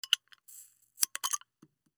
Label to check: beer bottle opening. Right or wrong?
Right